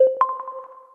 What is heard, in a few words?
xbox series sign on